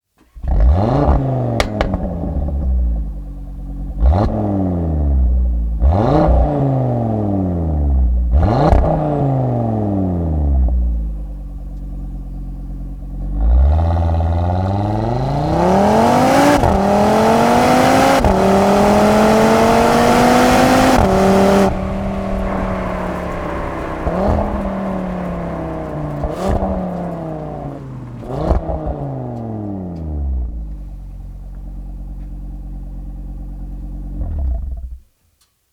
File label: EVOLUTION LINE AUDI RS 3 8Y; Sportback 8Y 2023